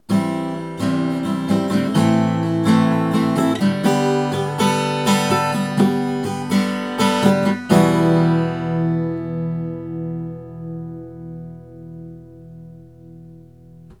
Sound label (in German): Gitarre